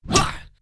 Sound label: daemon attack; propack